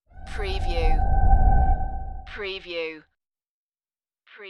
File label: Whoosh